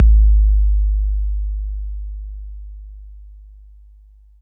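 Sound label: bass drum 808 Smooth C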